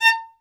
Synclavier Strings, SOLO VI; ILIO

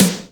Gate, Yamaha DTXpress Acoustic Snare

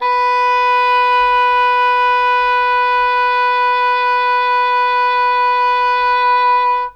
English horn B4; Q Up Arts; Denny Jaeger Private Collection

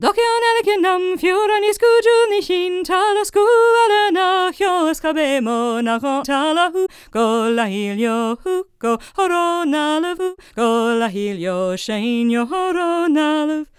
GAELIC, World Voices, GAELIC FEM

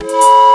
SFX TRAIN, Ragga & Dub Reggae; USB Soundscan vol.27; AKAI

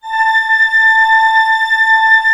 ORC A5 PP, Orchestra; Sound On Sound - Peter Siedleczek's Orchestra

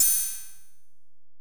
EPerc, Alesis DM-PRO

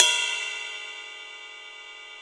CUP, CYMBAL

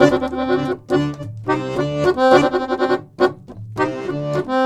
Balkanish Traditions; USB Soundscan vol.63; AKAI

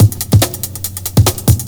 SPEED, Big Beat Drumloops

